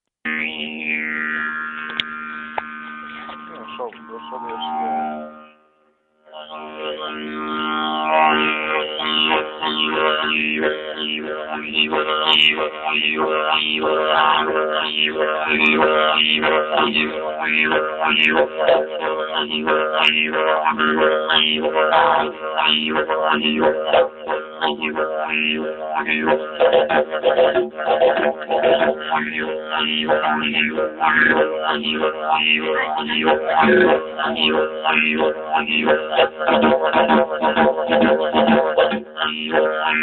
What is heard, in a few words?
didjredoo